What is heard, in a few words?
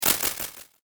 Sharp Fragmented Delay, sci fi music